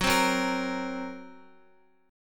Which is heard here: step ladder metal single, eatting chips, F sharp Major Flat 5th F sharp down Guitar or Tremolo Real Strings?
F sharp Major Flat 5th F sharp down Guitar